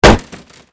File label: wood; Mafie 1